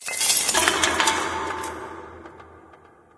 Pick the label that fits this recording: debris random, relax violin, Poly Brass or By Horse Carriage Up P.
debris random